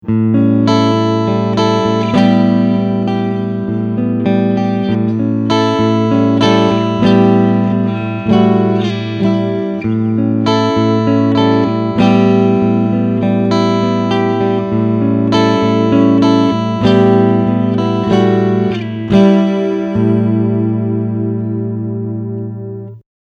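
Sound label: Clean Front Humbucker, guitar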